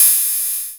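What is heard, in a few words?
EKO20 hi-hat open, EKO Ritmo20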